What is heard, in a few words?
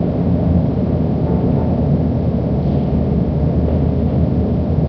underground, ambient, air exchange; hl2